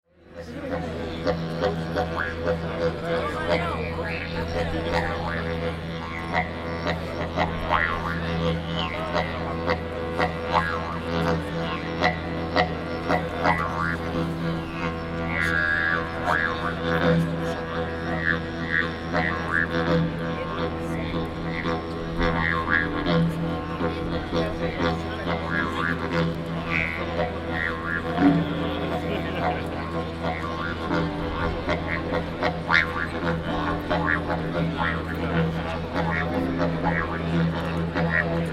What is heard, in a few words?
digeridoo